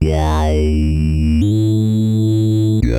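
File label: synth bass, drum and bass, 160 bpm, C; eJay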